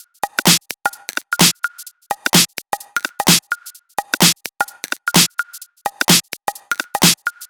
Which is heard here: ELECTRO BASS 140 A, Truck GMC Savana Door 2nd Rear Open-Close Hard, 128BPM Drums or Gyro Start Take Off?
128BPM Drums